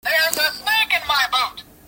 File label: snake boot